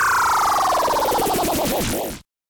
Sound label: fail sound; WhiteCat 3.0